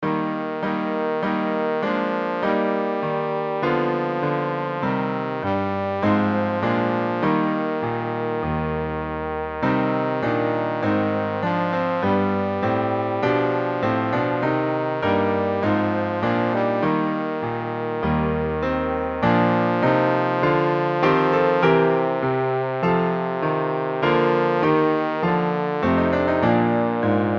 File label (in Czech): stara francouzska pisen pozoun klavir; trombón + klavír; Stará francouzská píseň - J.B.Lully